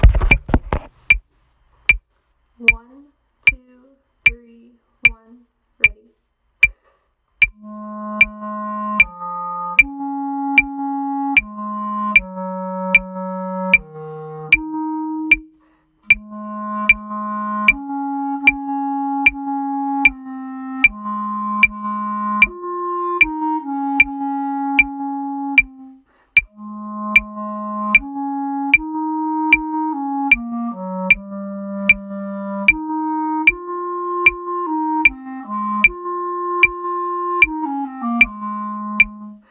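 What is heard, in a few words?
bass clarinet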